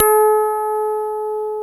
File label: KEY EP3 G 3, Digital EP's